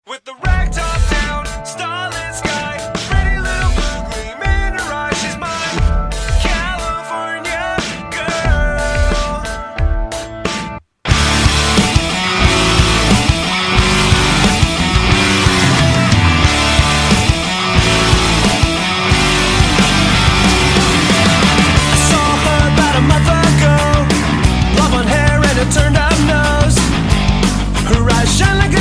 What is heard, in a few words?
ringtone